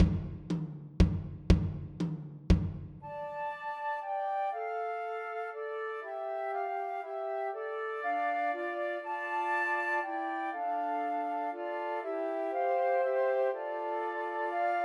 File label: Trios for Three Flutes; We Three Kings; Christmas